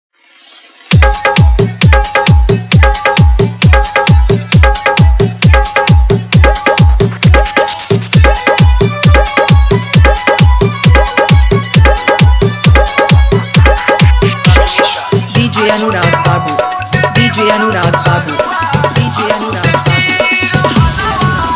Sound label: Remix Hard Bass